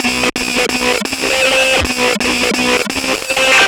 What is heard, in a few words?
Geetar Stack, Underground UK House & Electro, Synths; Sonic Foundry (Sony Creative Software)